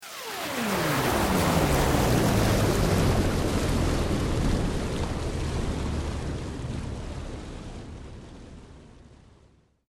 Sweep Mechanical Slow

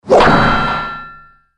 Circus Fairground Hammer Hits Bell

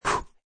Human blows out of pursed lips.; Exhaling; Voice

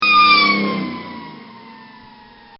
Firetruck With Siren; Sirens; Electronics; Bobby Cole

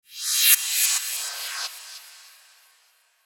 Whoosh Multiple Reverse Airy; Blastwave; Whoosh Sound Effects

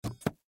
Pipe Pick Up Sand Tool Wrench; Machinery; Foley

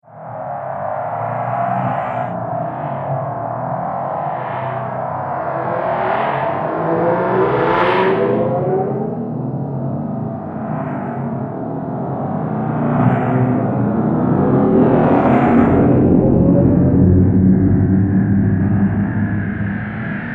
Space Car Soundscape, drone sound effects; sound design

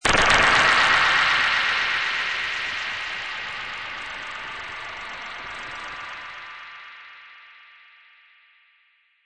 Alien Bbbless, Delayed bubbling alien tone; Alien Bubbles; Strange Tone Sound Effects; Sound Design

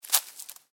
Footstep: Individual Footstep On Dry Leaves; Footsteps; Human; Stuart Duffield

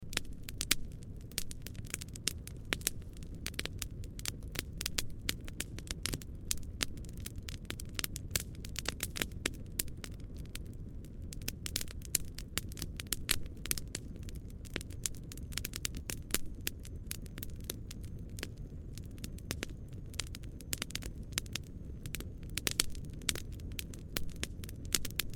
Fire Campfire Busy Crackles Snaps; War; BigRoomSound

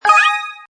Musical, Button sound; Multimedia

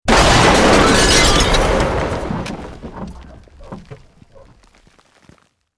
Building Collapse Medium; Created dramatic effects of buildings collapsing or breaking; Construction

